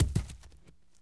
fall, dirt foot; The Elder Scrolls III - Morrowind

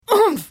Hit

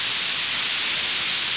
Destruction Bolt, magic; The Elder Scrolls III - Morrowind; Morrowind GOTY GOG

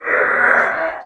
scrm

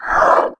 roar; The Elder Scrolls III - Morrowind

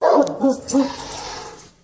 Big vicious hounds

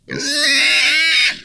scrm; The Elder Scrolls III - Morrowind

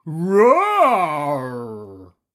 dino roar

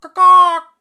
Ka Kah bird; SyrinScape; Syrinscape unplugged; Jungleplanet dinolaser battle

